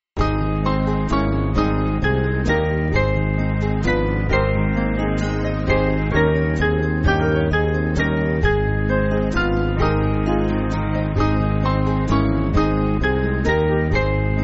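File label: Band, Free Music Backing Files for Churches; I Am so Happy; Sewell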